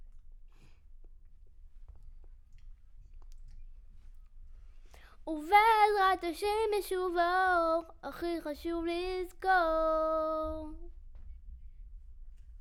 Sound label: Children Choir; Studio Bekol Children Choir